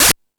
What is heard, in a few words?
Discoball, drum machine